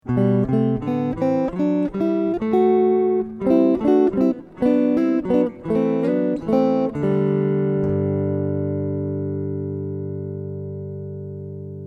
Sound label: scale